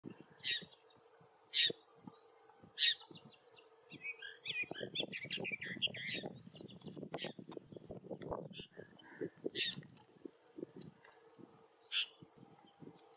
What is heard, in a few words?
Woodchat shrike